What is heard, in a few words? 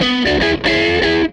Tele Guitar Loop